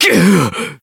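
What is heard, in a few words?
damaged, Japanese; joker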